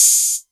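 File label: Hat Open, Novation Drumstation; DrumstationV1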